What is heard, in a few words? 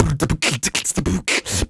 Human Beat Box 140 Pop; Loopmasters